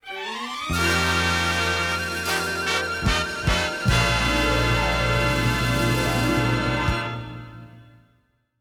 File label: Game show theme music; zoomerang